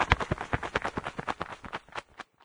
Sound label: flutter, cherub; doom3